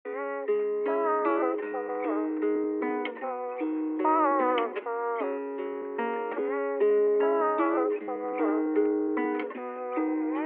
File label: Session Guitar